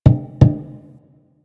Finger Thump; Warframe; Rail Jack Hunt

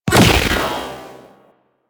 Barrage Fire; Warframe; DE; Sentients; Ortholyst

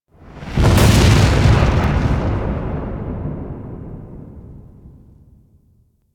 Destruction Heavy Hits; Ambience; Warframe; Corpus Railjack

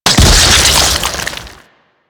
Cyst Explosion Single; Warframe; Infested Eris; Destructable Cysts

